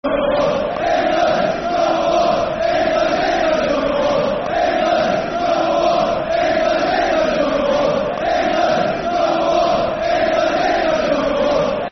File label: Football Chant; England's Number One; Huddersfield Town Htfc